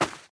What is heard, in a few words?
Ground short